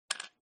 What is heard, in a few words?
doubling cube, backgammon